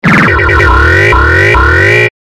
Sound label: pinball